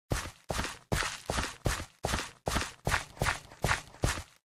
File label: Walking Minecraft